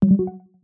menu cancel; operation

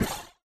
energy blast

